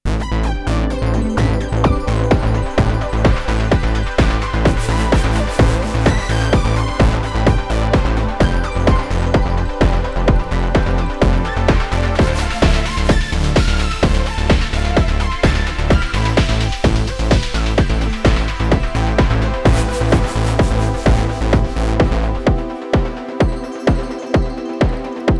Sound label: ARP; redshift6; ARP Club Helsinki; supercriticalsynthesizers